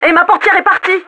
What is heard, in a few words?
CD DOOR; Rally Fusion Race Of Champions; XBOX; FRENCH